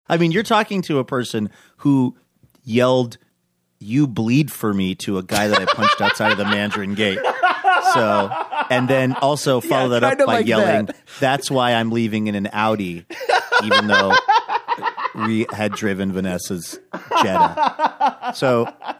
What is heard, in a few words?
having laugh; incident at mandarin gate